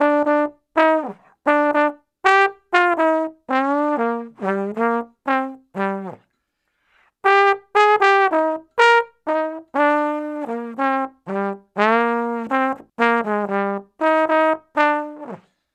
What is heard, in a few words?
Trombone Solo; Traditional; Sonic Foundry (Sony Creative Software); Jazz Solos and Sections